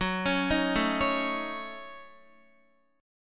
Gb Minor 6th, Guitar; Gbm6; arpeggiated; x,9,11,8,9,8

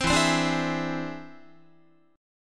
E Augmented 7th E down Guitar; E+7 strummed; JGuitar

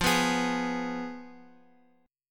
F Diminished, Guitar, Open F; strummed; x,x,x,0,2,3; JGuitar

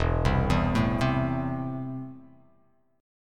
E Suspended 4th Sharp 5th E; 0,3,2,2,0,x,x,x

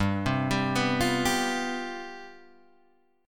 C Major 7th Suspended 4th, G, guitar chord

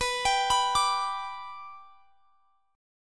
B-Minor Sharp 5th; arpeggiated

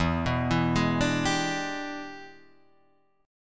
E 7th Sharp 9th, chord; E7#9 arpeggiated; JGuitar

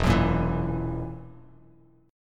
E Suspended 2nd Flat 5th E down Bass Standard; strummed; JGuitar

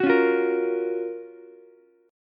Gb Major 7th Gb Ukulele; GbM7 strummed; JGuitar

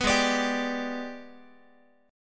G sharp Minor Major 7th Flat 5th, Guitar; strummed; JGuitar

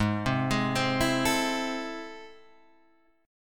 A Minor Major 7th, G sharp

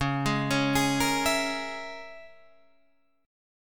Db Minor 6th Db down Guitar Open D

